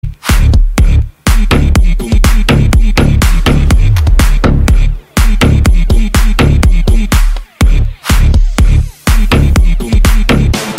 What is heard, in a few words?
trance electro ringtone